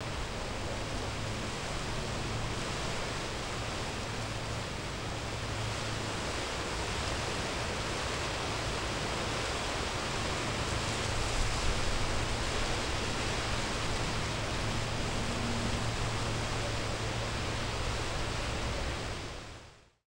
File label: PALMS IN, Palms In Wind; E-MU Producer Series Vol. 3 – Hollywood Sound Effects; Water